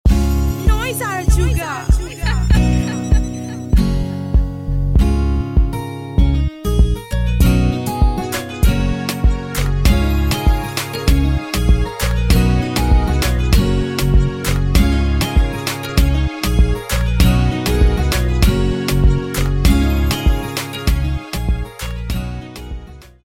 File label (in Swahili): Beat Ladha za Gitaa